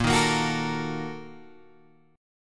Bb Major 7th Suspended 4th Sharp 5th, Guitar, Open G